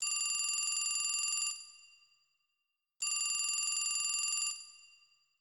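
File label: telephone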